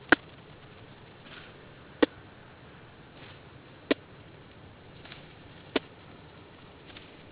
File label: click, whale songs